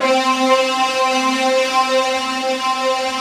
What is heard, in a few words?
big disto; East West - Global Sounds